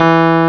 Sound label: Rhodes; Greytsounds; CLASS RHODES